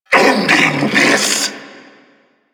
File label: attack vocal; Warframe; Duviri; Dax; Vocal Set B